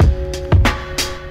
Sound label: Drum loops, Boombap